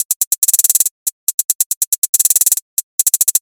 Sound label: Hi-Hat loop; Bounce